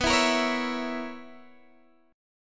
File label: B Major 7th Suspended 2nd Suspended 4th B down Guitar DADGAD